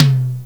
MIDTOM, Korg ddd5 drums

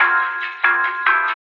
Mambo; Old Lounge Recordz Mixes

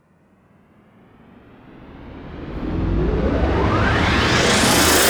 FX Sweep Up

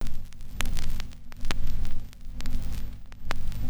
Rythmic Noise 130BPM; layering

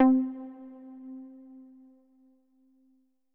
C3, SYNTH WAVES; Music Store Sound Service; Einstein

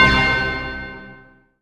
SYN Glockwerk; Best Service Gigapack 1

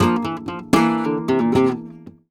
guitar a#, Flamenco Remates De Pulgar; ProSamples vol.46 - Flamenco

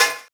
SD, Drum & Bass, 160 BPM

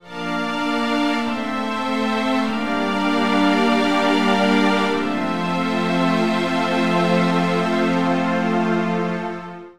Smooth Groove Strings, 70s Analog Funk; Sonic Foundry (Sony Creative Software); What it Is!

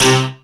SYN Oct Met; Best Service Gigapack 1

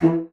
bassoon E3; Xsample VOL-6; C BASSOON

